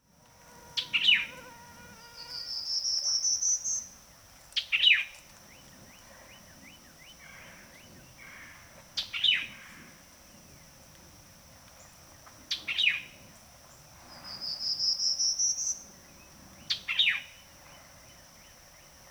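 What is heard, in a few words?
Warbling Vireo 9 songs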